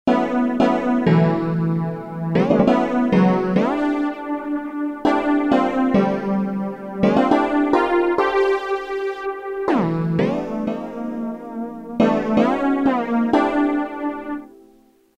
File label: Summer Time, Roland M-DC1